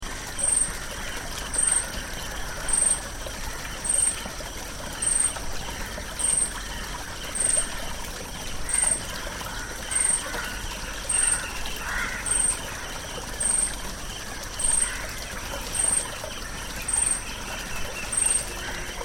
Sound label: backwater insects critters water